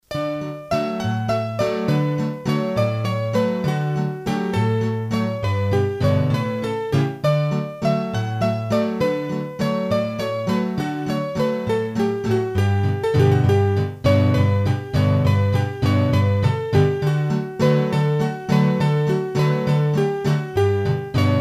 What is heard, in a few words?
hurdy-gurdy, Valses; La Bigaire